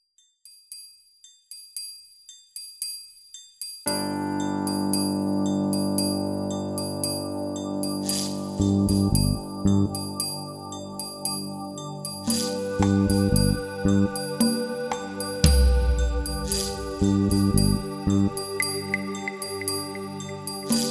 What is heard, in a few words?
reggae